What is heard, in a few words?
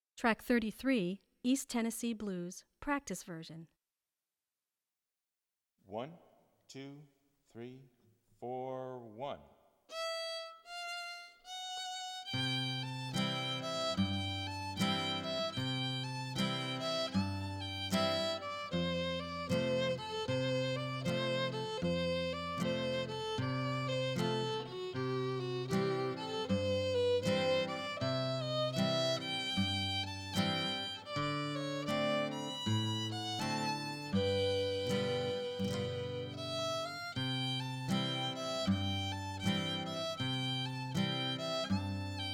east tennessee blues practice version